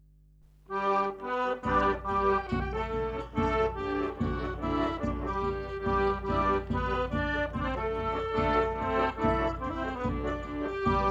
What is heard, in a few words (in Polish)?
polka